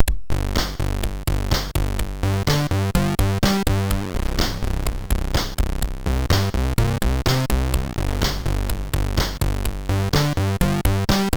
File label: Lights On, MOS6581R3